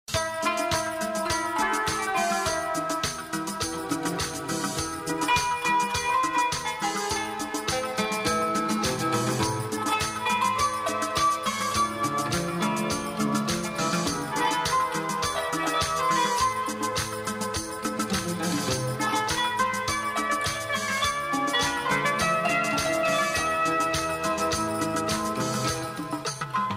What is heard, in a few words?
Instrumental music turkish with out word happy